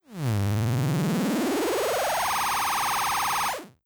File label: charge lowbit